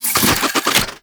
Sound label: Fist Spin; Enemies; Warframe; Tyl Regor